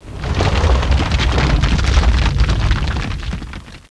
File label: quake